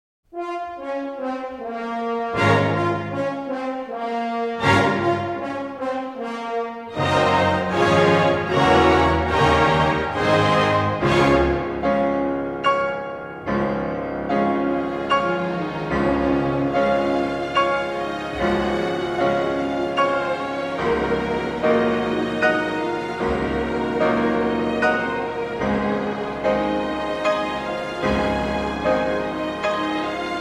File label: Piano Concerto in B-Flat Minor I. Allegro Non Troppo e Molto Maestoso; Alceo Galliera; Claudio Arrau; No. 1; Op. 23